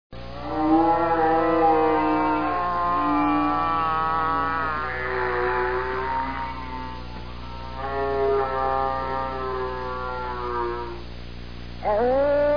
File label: moaning